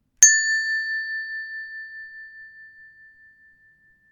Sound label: Hand Bells A Single